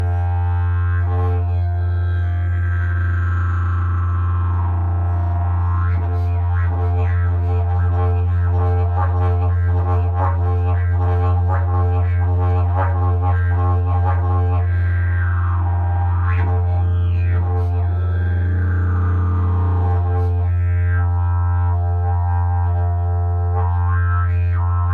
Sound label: didgeridoo; jilm tanecnik